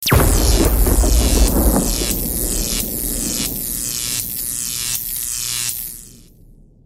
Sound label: electric execution sound effect